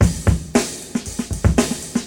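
116 bpm 60s breakbeat sample d key, kick tuned to the D note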